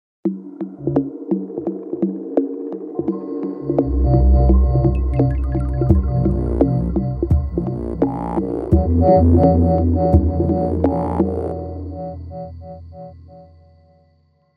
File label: Old organ